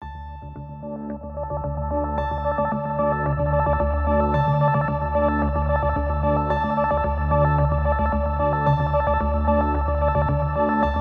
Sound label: Dark And Tense Background Atmosphere